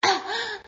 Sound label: Cough; Lewd SFX